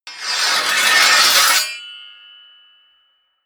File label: scrape